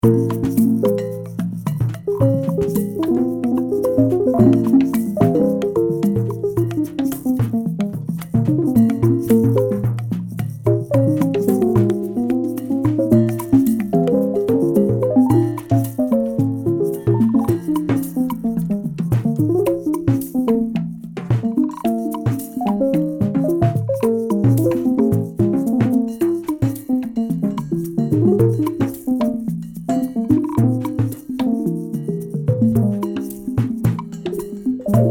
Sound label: Log Drum w Dust